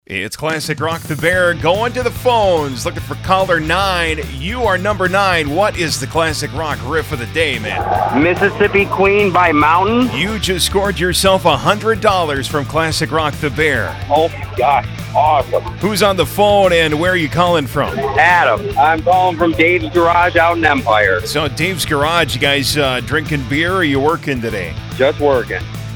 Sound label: riff